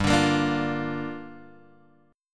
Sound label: G Minor 7th, Guitar, Open G